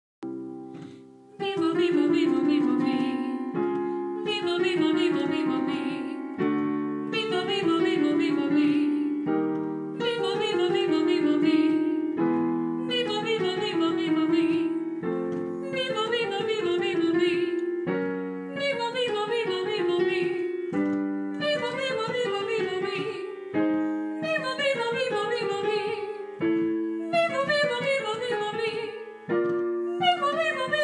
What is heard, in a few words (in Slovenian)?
upevanje